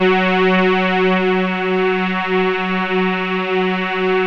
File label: MOVE BASS; Masterbits; Vintage Voltage